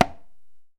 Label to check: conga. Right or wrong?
Right